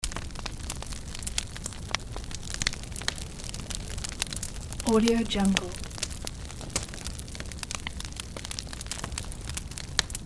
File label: bonfire